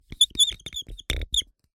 Handwriting Marker Whiteboard; soundbits

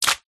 household mop wet on tiled floor single splat; zapsplat

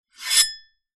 metal scrape; foley

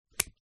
credit card cut in half with scissors; zapsplat

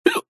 human male single hiccup

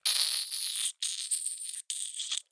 Gore Squeeze Spurt

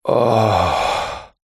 human male exhale ahh pleasure groan; zapsplat

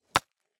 ICE Hockey stick strike puck

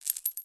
household neckless small silver place down; zapsplat

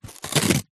cardboard box small glossy skin rip; foley

